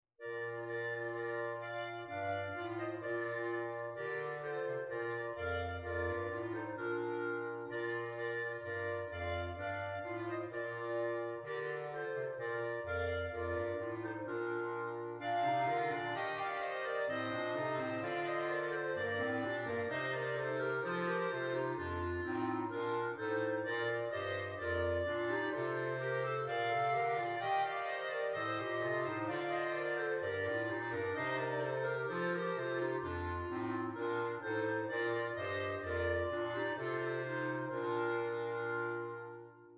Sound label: CLarinet; clarinet quartet